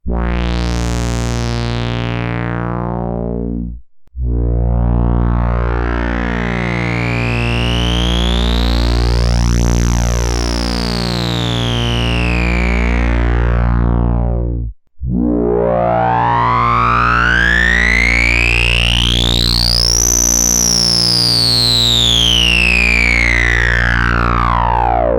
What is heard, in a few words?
cmosed ms20 sweeps